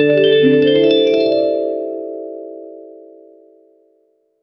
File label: clean smooth ringtone